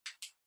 Portable MD (Far Button)

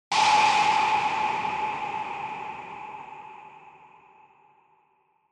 Accent

